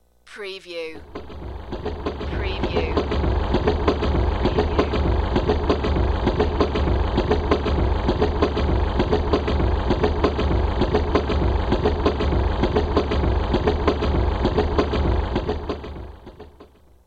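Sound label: Train Passing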